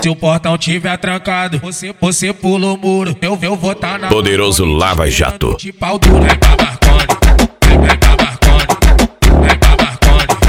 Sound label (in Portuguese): funk sertanejo